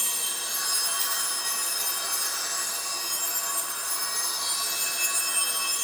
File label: Citrine Empowered Geode Loop; Warframe; Warframes